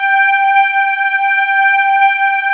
MKS RAW PUL, LEAD

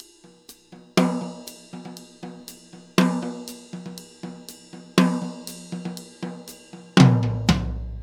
New York Loop, Drum Tools, Laidback; Sonic Foundry (Sony Creative Software); NY Loops

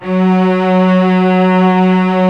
ARCO CELLI; OMI - Universe of Sounds

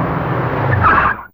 Screech, Fairlight CMI IIe; Effects3

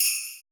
sleigh bell; Drums In Your FACE!; NEWJACK

